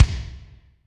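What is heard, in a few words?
Kick Spike, Alesis D4; Spike Rm; Kik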